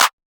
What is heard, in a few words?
clap; FL Studio; xander brown drumkit